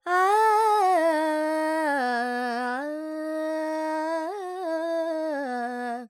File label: Phrygian, Vocals, Carnatic Female, Alaps, Dry